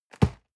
box hit medium

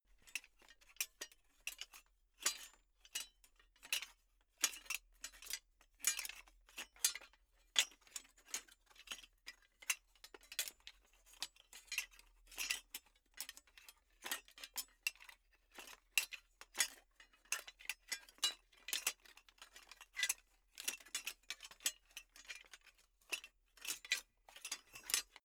troops metal layer movement

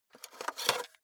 cassette out of cassette player soft

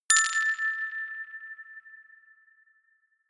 notification glockenspiel rattle fourth

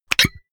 pop

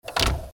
door lock

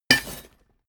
impact chain leather

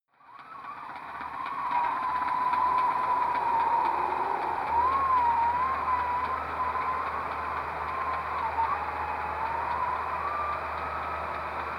harbour

